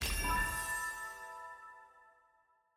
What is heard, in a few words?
ui purchase click